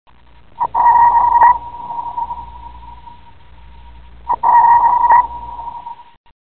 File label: sonar